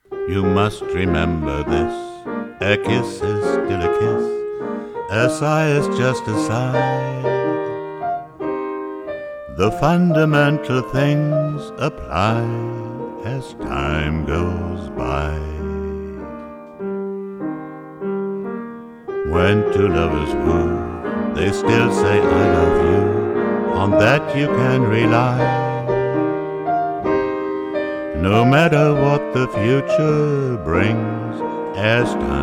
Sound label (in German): mit Gesang, Klavier; Yamaha Klavier